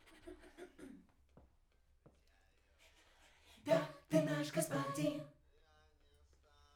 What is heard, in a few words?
Backs SCHOEPS